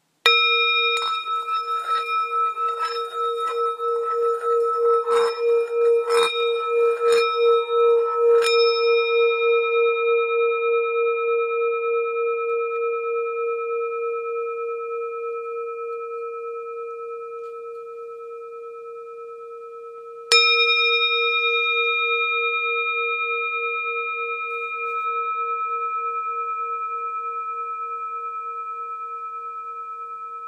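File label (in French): Bol bengale 3-400 la dièse, Bol chantant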